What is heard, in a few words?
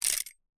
ar slide